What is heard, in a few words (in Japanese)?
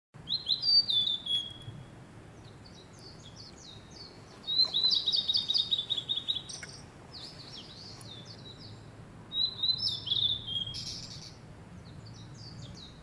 オオルリ, blue and white flycatcher